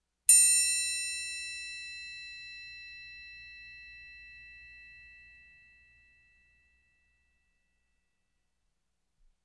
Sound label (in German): Instrumente Triangel Gross